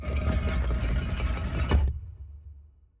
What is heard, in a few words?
Wall Rasing Up; TANK; 3Souls